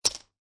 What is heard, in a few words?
Poker Chips Dropping On Poker Chips